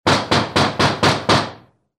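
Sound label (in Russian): в дверь веранды; Звук стука в дверь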